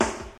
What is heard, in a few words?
Snappy Snare One Shot A# Key, Royality free snare drum sound tuned to the A# note; snare drum samples